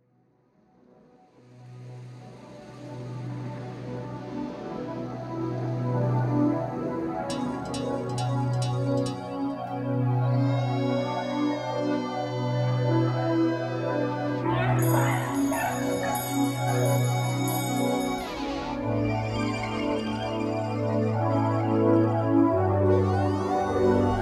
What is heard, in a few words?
Eerie Ambient; production music; Atmospheric